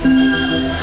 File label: mob help